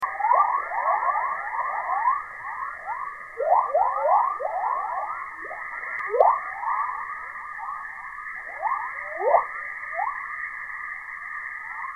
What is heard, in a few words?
chorus; physics radio